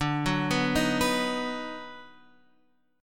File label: Eb Minor Major 7th Sharp 5th D, chord